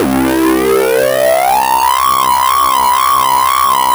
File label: C3, Synth Hits; Zero-G; Soundclash Nu Dance Electro; Acidized